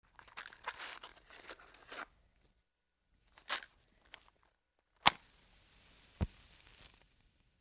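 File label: Match Strike Match Box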